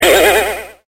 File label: BOING